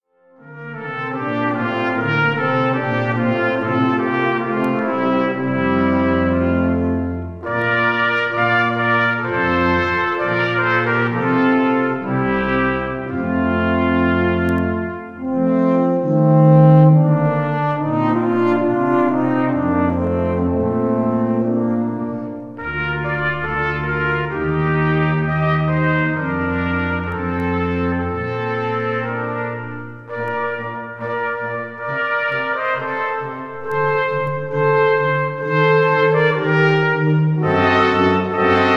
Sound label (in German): sinfonie fuer blaeser f dur andantino